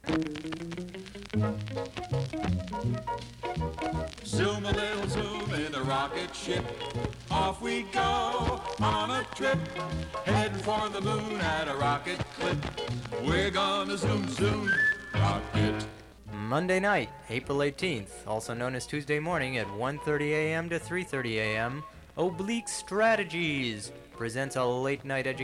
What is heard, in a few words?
Singing Science Cart; WBAI